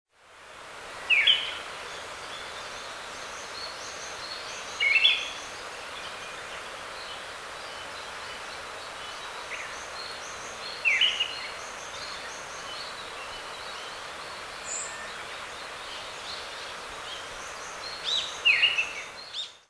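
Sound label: Eyebrowed Thrush; mamichajinai; hyogo; koe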